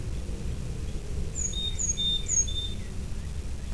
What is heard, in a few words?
chickadee song, Carolina Chickadee